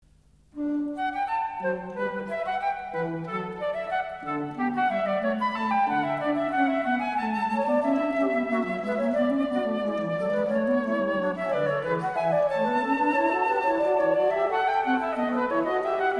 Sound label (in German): Inventione a 3 D-dur